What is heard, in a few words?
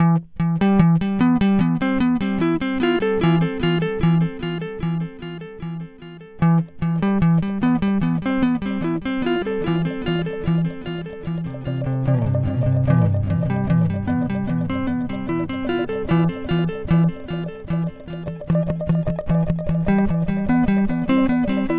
Starlight Echo Station Precision